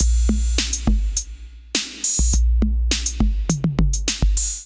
606 Kit groove 103 bpm, Drum Essentials; MIDI Clips; Excalibur; Ableton

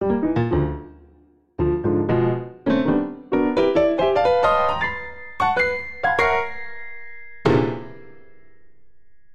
Phrase Free Big Ending 77 bpm, Grand Piano; MIDI Clips; Ableton; Factory Packs

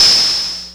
Clapbox Noise; Ableton Foundation FreePacks

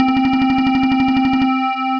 Synth Rhythmic; Twilit; Ableton; Glitch and Wash

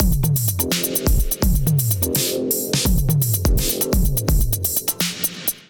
Expo Kit Melodic 84 bpm, Drums; MIDI Clips; Drive and Glow; Ableton; Factory Packs

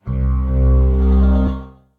Guitar & Plucked; Melissa

